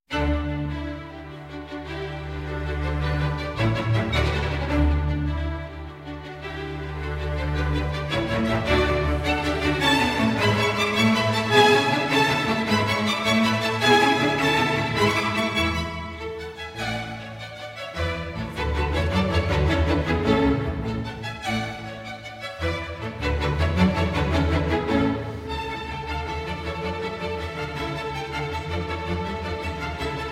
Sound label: Cello Concerto in D Major Allegro; G. 479